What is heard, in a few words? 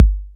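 808 kick, drums perc, Roland; Rubber Chicken Software Sample Bank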